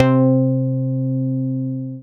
Dance Floor Bass, Multisample Basses

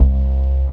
Single Acoustic Basses

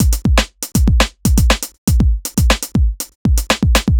120 beat basic, Nu Jazz House; PowerFX

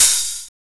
Open HH, Cymbals

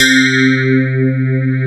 sparkles

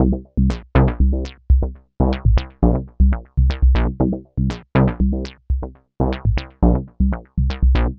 Bassline, Prototechno

